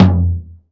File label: Tom Blue Room; Drums